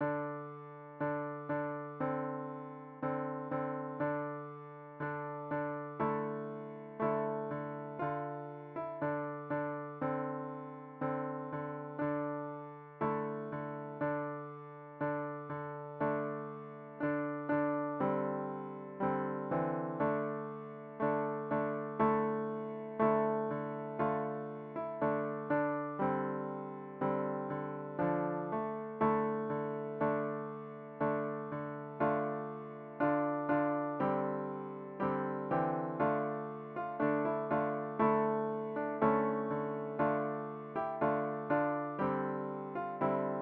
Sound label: Bransle de la Torche for MIDI, handbell